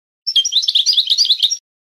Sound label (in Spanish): adorno de tabli; campero